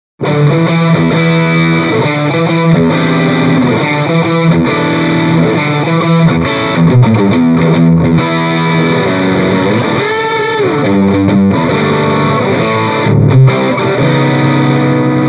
Mini Rox Mic Inside PRIVATE JACK Distortion Stratocaster